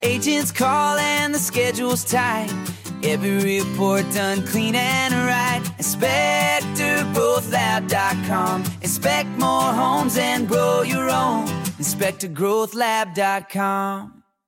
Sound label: jingle